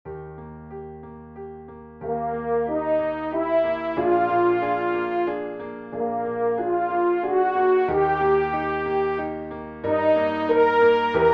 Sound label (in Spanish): Solo Original para Trompa en Mi y Piano; trompa en Mi bemol y piano; E-Flat French horn/ piano; Música / Music